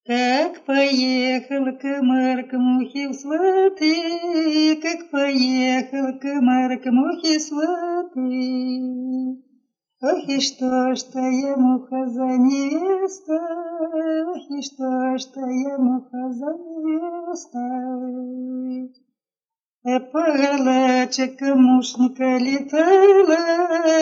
колядная хороводная; Как поехал комар

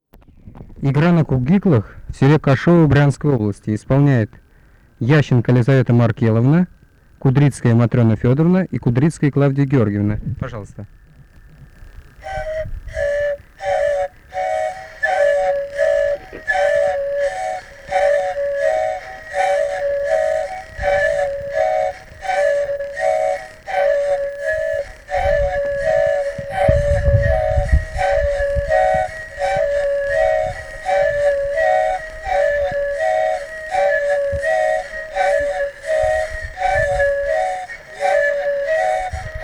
Наигрыш на кувиклах, Кашово

